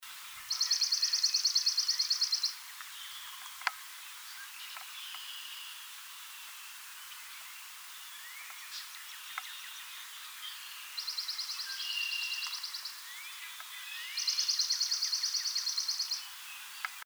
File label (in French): bruant des marais